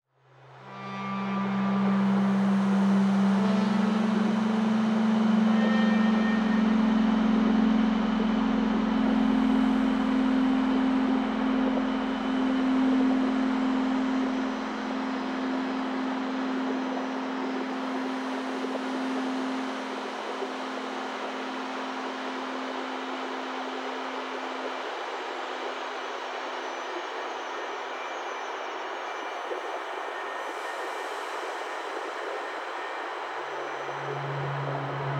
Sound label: Skysounds